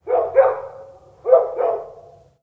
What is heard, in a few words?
dog, ambient outdoor